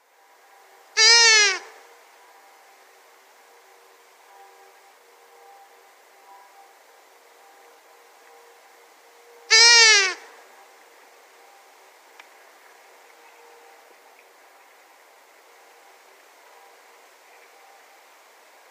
Long-eared Owl female display

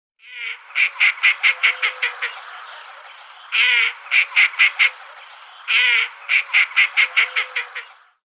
Northern Pintail female

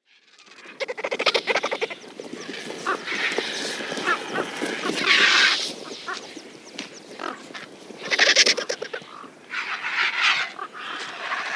Eurasian Griffon

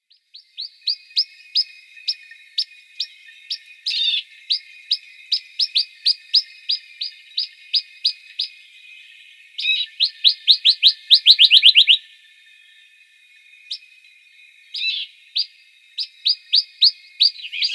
Rock Nuthatch calls

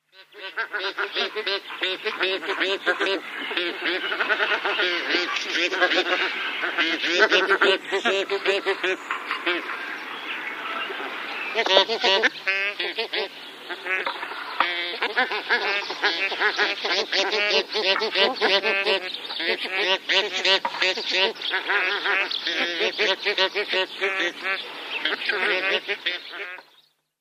Bar headed Goose

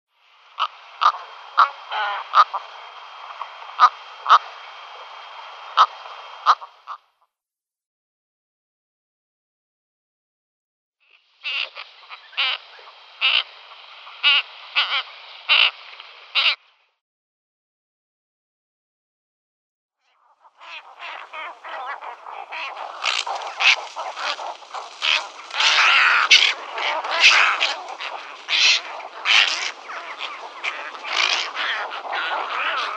Cattle Egret

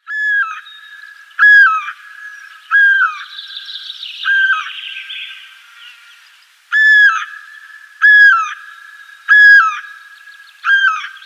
Great Spotted Cuckoo display

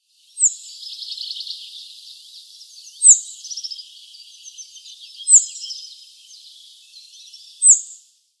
Rock Bunting calls

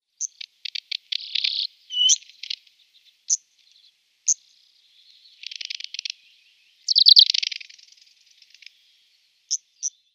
Arctic Warbler calls

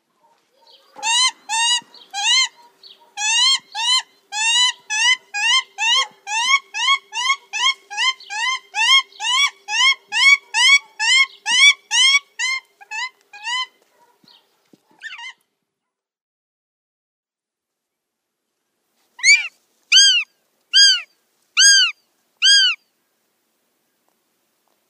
Goshawk female

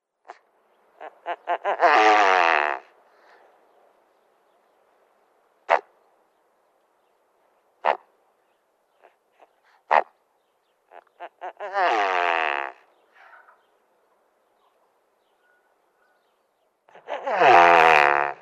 Great Bustard male calls

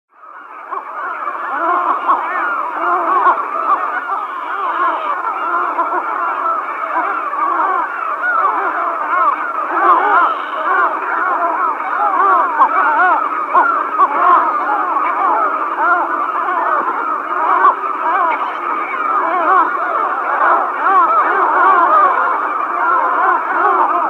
Great Black-headed Gull

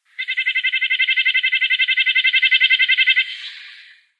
Red-breasted Nuthatch song

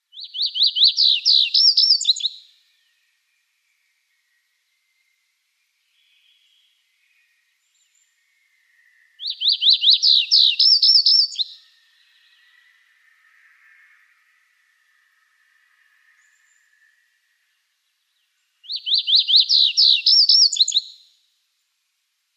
Chestnut Bunting song

